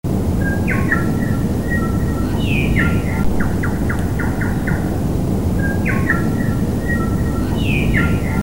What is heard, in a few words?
tui, Fiordland